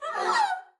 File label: double bass arco noise high medium